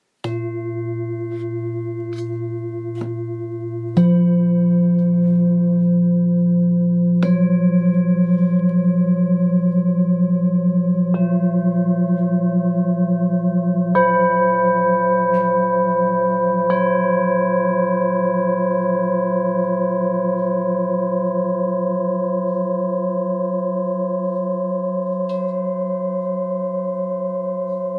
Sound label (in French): bols thick harmoniques